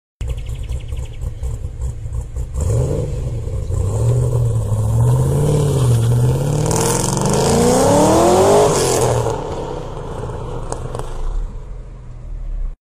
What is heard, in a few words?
loud v8 ute beast